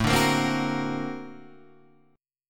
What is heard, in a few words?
Bb Augmented Major 9th A